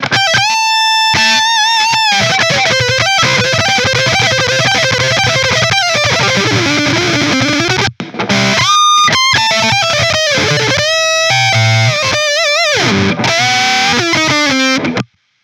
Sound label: Guit Solo B6, guitar